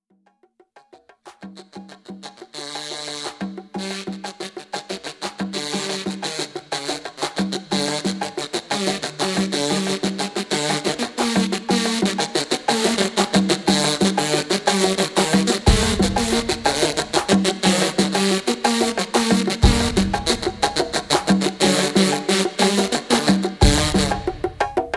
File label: Kazoo